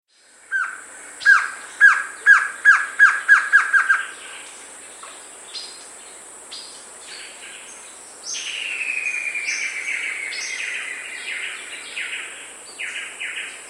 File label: Glossy Antshrike; S. America